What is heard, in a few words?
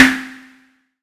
SERGE Snare, drums; Polyend; Stazma & Concrete Collage; Concrete Collage Drum Kit; Concrete-Serge Drums